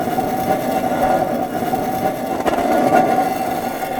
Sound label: Metal Stn Slide Lp